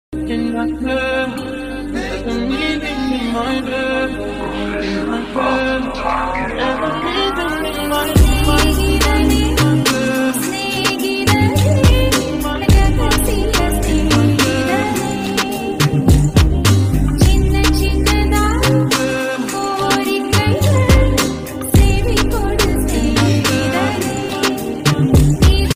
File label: call ringtone